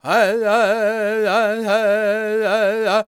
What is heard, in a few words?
C# MIN, World Voices, LAKOTA